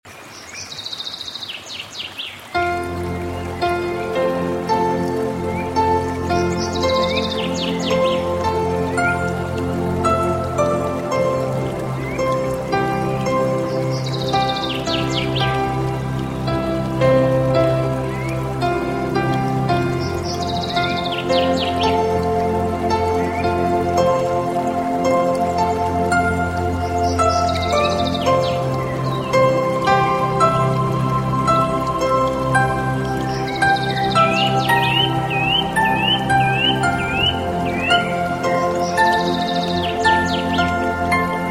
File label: beautiful peaceful ringtone alarm for cell phones